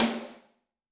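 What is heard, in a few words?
Open Rim B, Yamaha DTXpress-II snare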